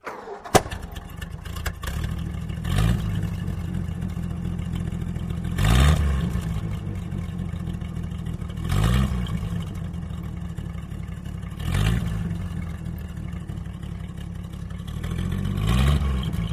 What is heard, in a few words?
1936 Ford Start Rev, ford truck old v 8